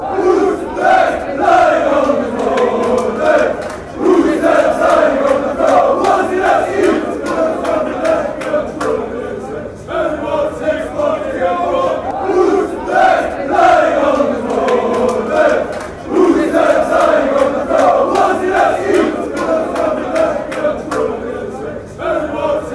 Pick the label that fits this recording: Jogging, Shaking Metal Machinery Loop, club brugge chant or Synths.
club brugge chant